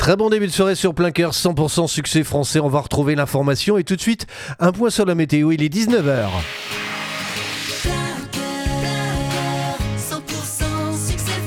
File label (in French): TOP HORAIRE NEUTRE; PLEIN COEUR; MOULINS